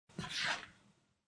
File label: Wallet Slde, Sound of a leather wallet dragged across a wooden table.; Slides; Objects